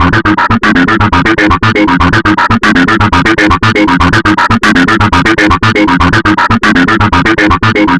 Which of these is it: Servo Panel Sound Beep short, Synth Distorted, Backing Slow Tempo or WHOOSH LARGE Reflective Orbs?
Synth Distorted